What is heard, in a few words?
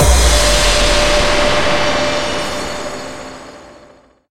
stinger; Mirror's Edge (2008)